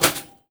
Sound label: Foley